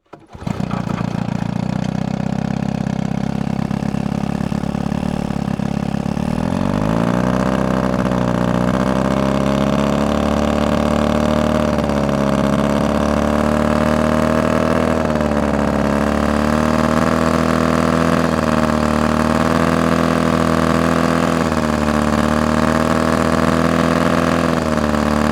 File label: boat outboard motor; transportation